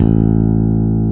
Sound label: 5 STR P BS A2, PICK BASS